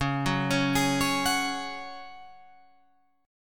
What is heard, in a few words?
C sharp Suspended 4th C sharp down Guitar Open F; arpeggiated